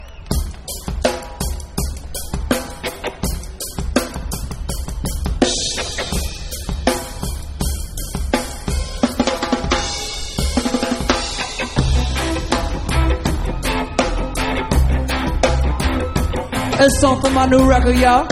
glove jazz

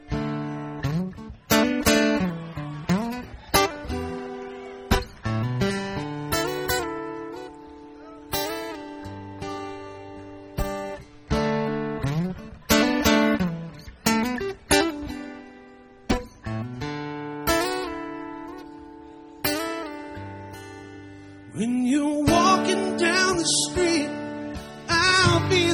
jazz

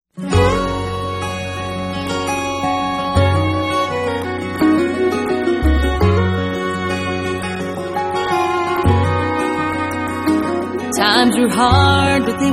bluegrass

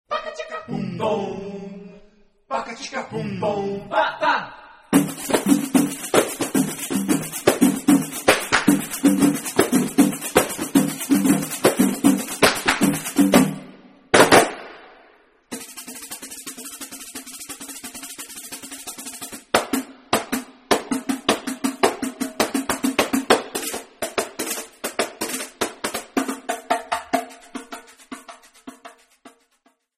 donkey beat

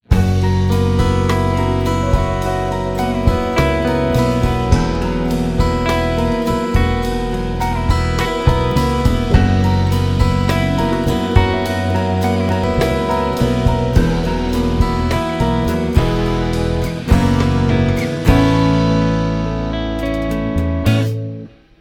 pulse

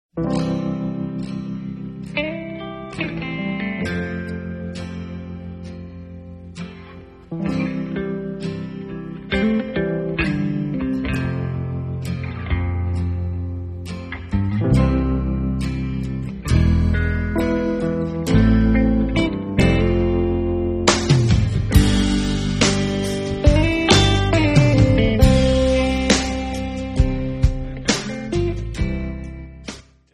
jungle

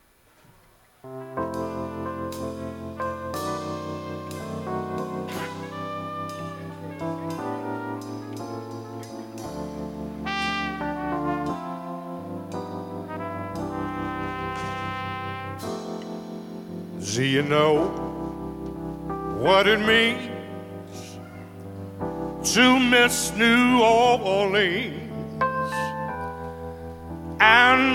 jazz blues

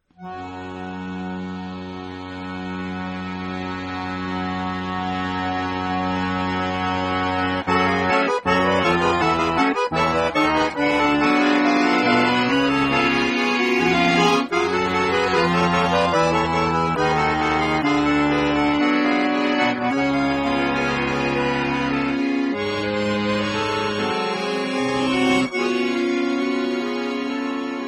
donkey beat

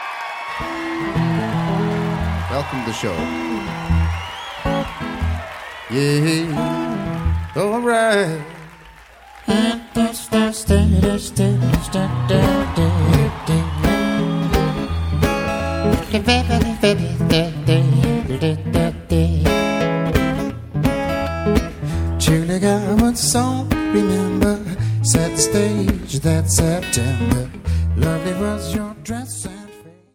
solo

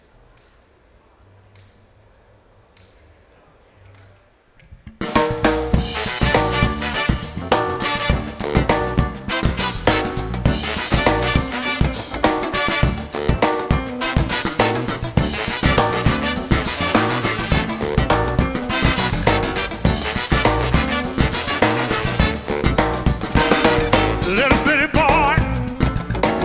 jazz blues

